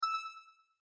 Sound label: Beep Electronic Echoe; User interface feedback sounds; Multimedia